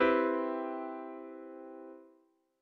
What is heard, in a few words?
C maj7; ackordtabell